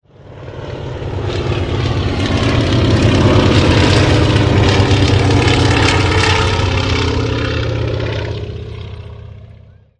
antonov an2

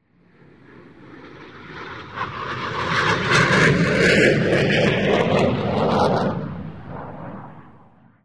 eurofighter, Aircraft sound record

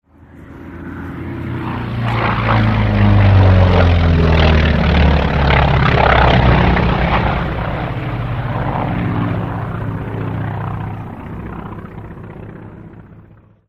wildcat passage, aircraft sound record

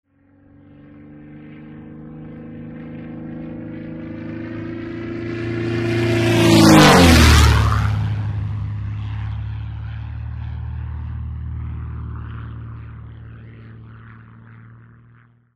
zlin passage, Aircraft sound record

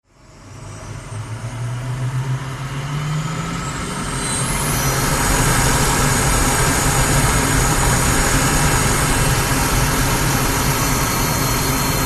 Alphajet cockpit, aircraft sound record

